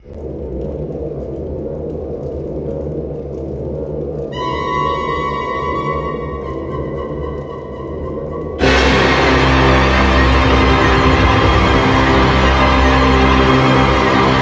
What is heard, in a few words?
music tunnels elevator fall